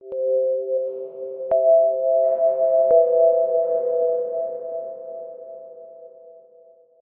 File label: power plug